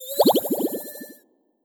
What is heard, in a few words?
Harpoon Bubble Kill